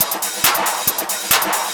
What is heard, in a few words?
percussion loops 138; PS-45